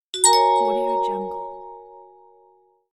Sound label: incoming message